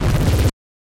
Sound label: stylized glitch sound; soundscrate